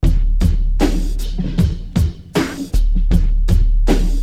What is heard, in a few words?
LOOP, Hip Hop; InVision Interactive; 40 Oz Phat Slammin